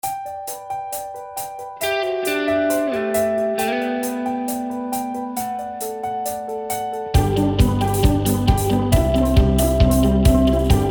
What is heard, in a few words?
Jingle; New Richardson